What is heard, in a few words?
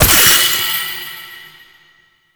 Horse Racing Orb Hit; Warframe; Duviri